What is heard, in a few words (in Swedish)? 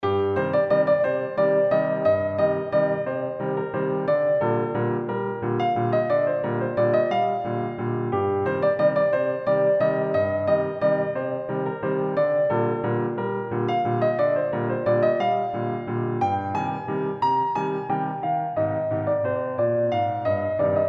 Liten vals